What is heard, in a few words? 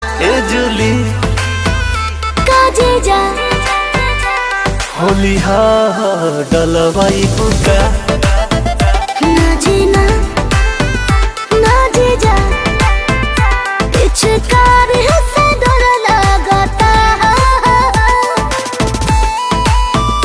Ringtone